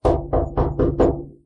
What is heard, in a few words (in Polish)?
pukanie